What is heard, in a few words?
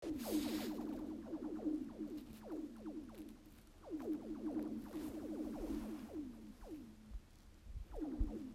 Skating on Jackson Lake